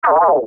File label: dry fire